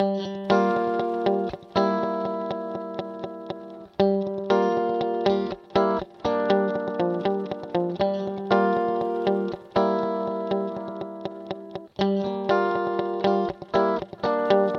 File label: Guitar Yamaha D5000 Long FB Gate; Vintage Digital